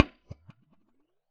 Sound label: Science 2x15 15PR400 U87 Port, Bass Cab IRs